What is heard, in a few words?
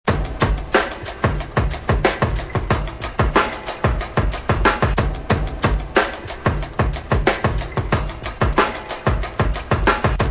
drum loops